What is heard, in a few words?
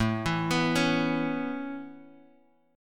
A Major Add 9th A Guitar Open F, chord; 9,11,11,8,x,x; Aadd9 arpeggiated